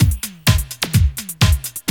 loop, 125; Sound & Vision - Gigapack I

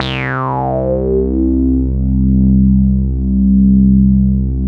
BASS, Analogon

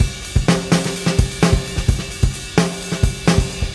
Beat Loops; Zero-G; Monster Beats

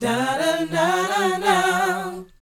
BOYZ, Vocal, R&B Dance

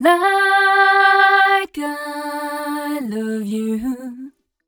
f mel d, fem Vocals

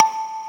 SYNTHESIZER DIGI DECAY

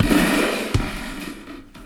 Thunder Drum; Sonic Foundry (Sony Creative Software); The Electronic Music Manuscript Collection; Battery 3 Sample Kits

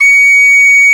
WHISTLE, Ireland; E-MU Producer Series Vol. 7 – Old World Instruments

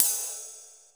STICKY RIDE, effected drum; Crypton - DrumBase5000

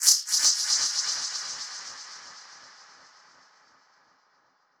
Perc Hit, dub percussion; musicradar

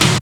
snare trash; Roland L-CDX-01; Snares 5; Sn Modules 5

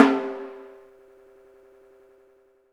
Timpani f; Roland M-OC1

